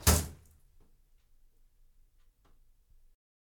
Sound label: Slap